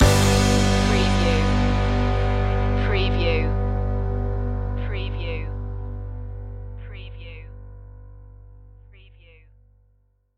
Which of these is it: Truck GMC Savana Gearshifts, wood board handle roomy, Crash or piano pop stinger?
piano pop stinger